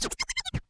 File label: Cuts, Vinyl Record Samples